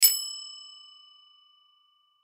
Bell Store Clerk, Bell Store Clerk Hotel Ring Ding; Foley; Blastwave